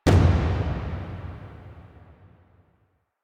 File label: New Player Warframe Select Menu Music Loop End; UI; New Player Intro